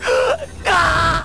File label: scream